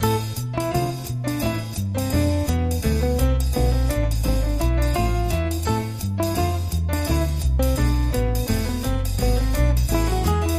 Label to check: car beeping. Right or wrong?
Wrong